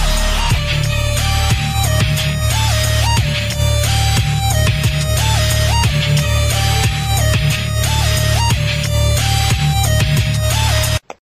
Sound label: Metal Dub